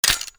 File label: attack pre launch; Li Li Shadowpaw Sound Effects; Li Li Heroes of the Storm Responses; warcraft